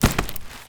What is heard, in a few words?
Forest w Twigs